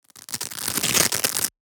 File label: Velcro small patch tearing